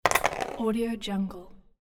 board game dice roll

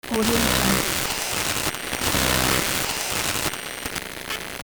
radio technological noise; soundmasterfx

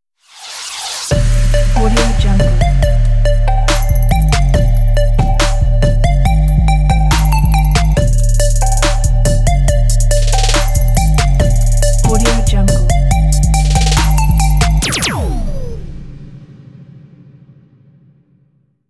trap ident

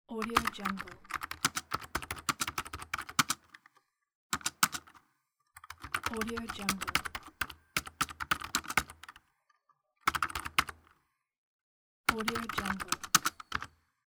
keyboard typing

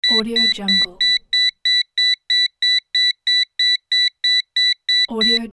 cordless telephone intercom beeping

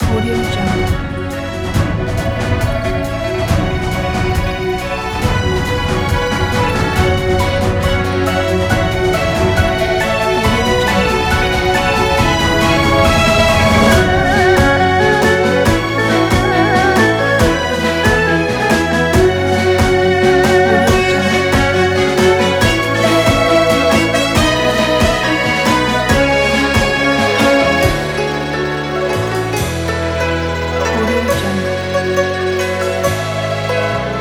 chinese kungfu action fight

